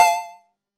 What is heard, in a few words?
checkpoint; Game Events